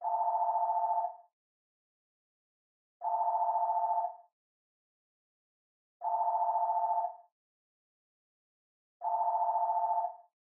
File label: Telephone Ringtone